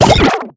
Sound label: player item dropped legendary